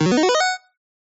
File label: Ball, Skee-Ball; TheStable; Pyralix